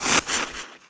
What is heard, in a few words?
Menu Horror